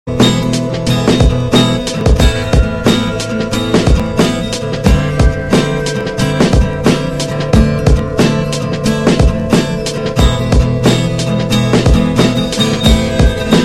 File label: iphone x remix ringtone